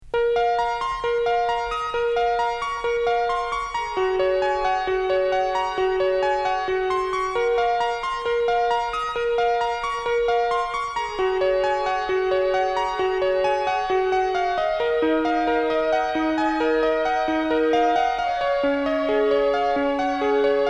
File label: Noise From China